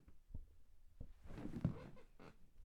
Squeaky Mattress Person Sitting Down

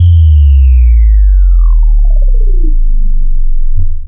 Distort low pulse

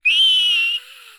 Child S Scream

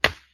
Stone Hit

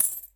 Coins Small Sound

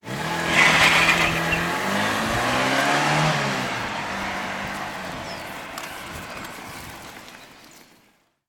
Fast Starting Car

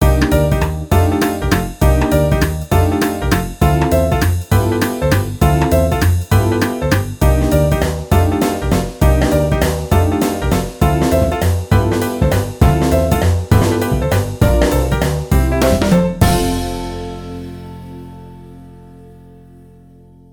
Piano Brake

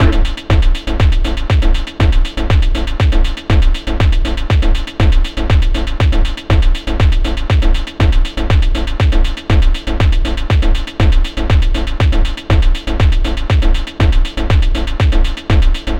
120 Ravey

